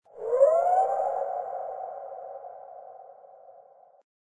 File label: animal call unknown howl; Source Engine; tf; lair